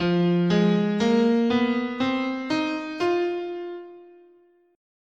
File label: F Blues, scale